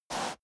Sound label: step boots sand slide short